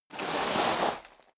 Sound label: step sneaker gravel medium slide long; schritte